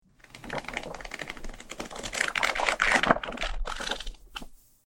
本をめくる音

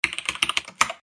パソコンのキーボードをタイプする音

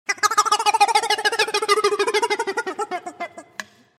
ケロケロのおもちゃ

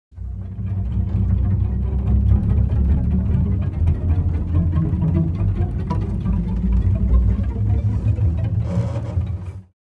身の毛がよだつような恐怖

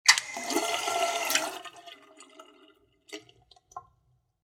水道の蛇口をひねる音

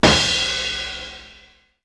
スネアとシンバルのクラッシュ音

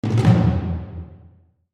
犯行中の犯人の胸中

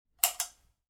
紐付きの照明のスイッチ音

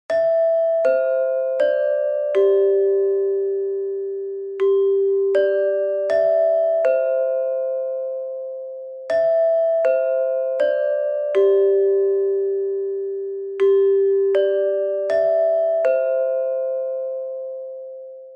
チャイム

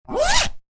チャック・ファスナー・ジッパー音

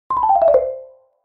軽い感じの場面転換アイキャッチ

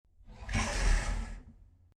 襖を開閉する音

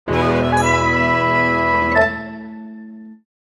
成功した時の嬉しい音

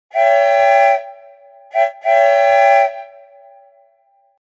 汽車の汽笛音

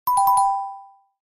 クイズの正解・不正解

